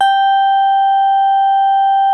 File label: SIDE MID G5, SYNTH PLUCK